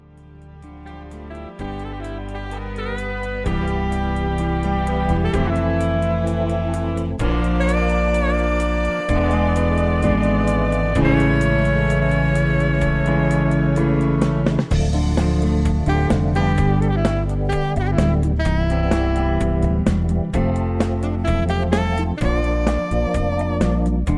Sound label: Instrumental Lower